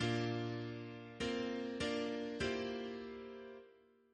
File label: Single chant in B♭, 3rd voice is for Pedal held throughout, Organist; Hurd